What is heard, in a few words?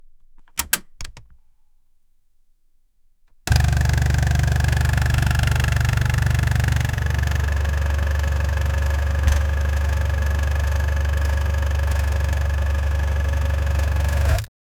electric motor starts up